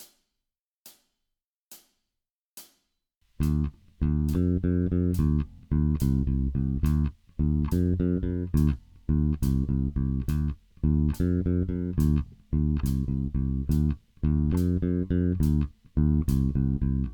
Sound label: Heavy rock blues, baixo; heavy rock shuffle